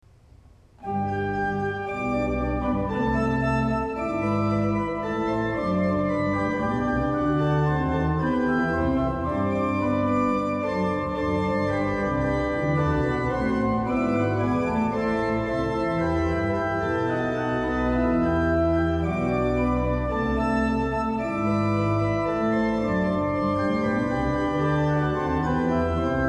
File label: Organ Canon; Canon Of People Approaching Peter